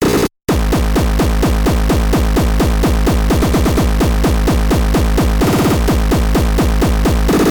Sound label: Speedcore Drum Kick